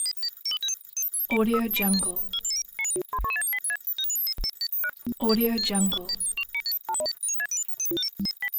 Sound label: hitech cybernetic device